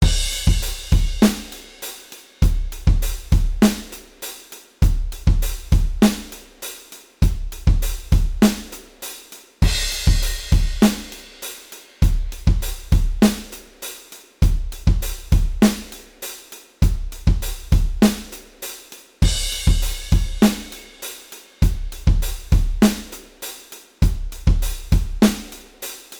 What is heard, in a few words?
modal surf, drums